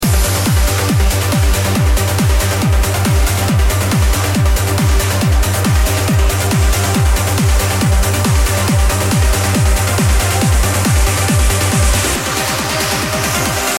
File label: Uplifting Transition, No-Talking DJ Mix; Uplifting Only 670